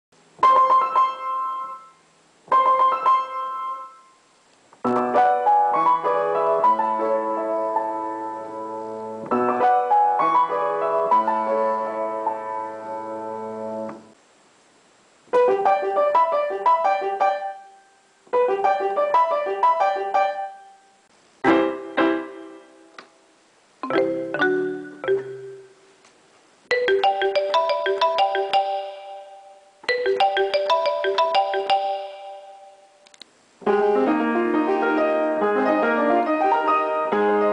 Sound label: cell phone sounds and ringtones on the piano